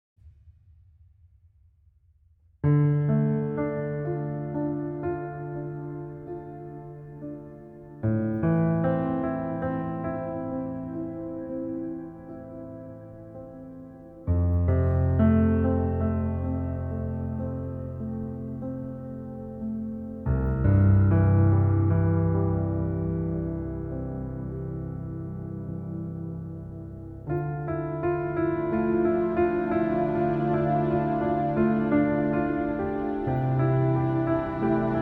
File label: embers orchestral version